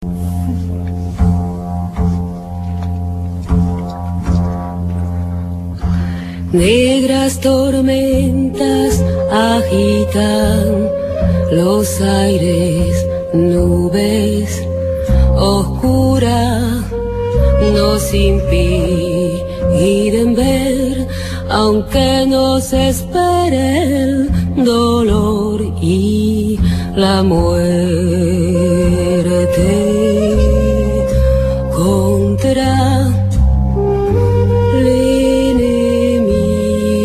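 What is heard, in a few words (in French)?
Chant révolutionnaire espagnol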